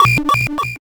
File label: science fiction console computer alert tone warning